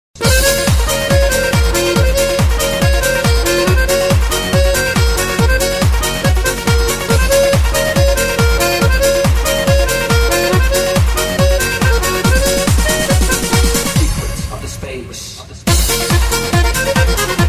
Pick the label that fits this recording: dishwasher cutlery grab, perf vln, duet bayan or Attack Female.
duet bayan